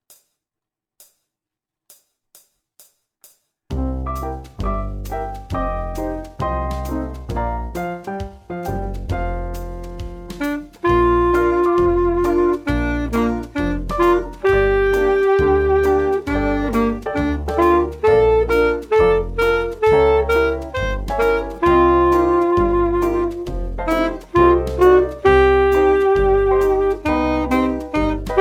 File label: dolly sax